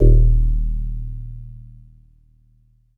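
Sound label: Jazz Harp D#2; Kawaii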